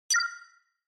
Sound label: Cyber